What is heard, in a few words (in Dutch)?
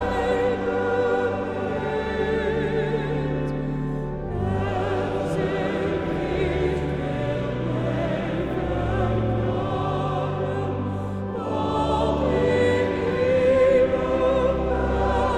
Koor (mannen); Hierdens